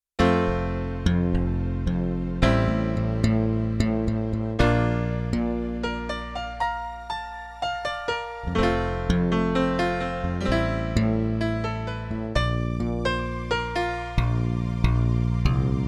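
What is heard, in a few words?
bass, piano, strings, Yamaha TX16W; Europop Performance 1; Ft bass-Piano-FCstrg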